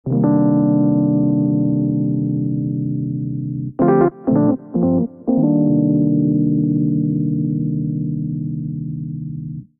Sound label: Muddy Whirl Piano C Minor 85bpm; MIDI Clips; Accompaniment; Ableton; Chop and Swing